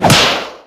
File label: attack; saitam